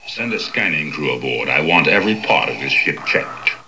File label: scanning